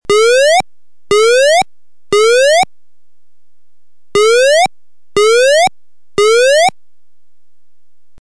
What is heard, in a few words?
Evacuation Whoop; signals